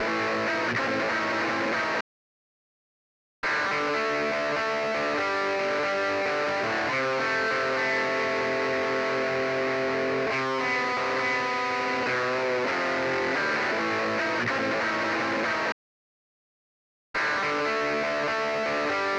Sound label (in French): guitare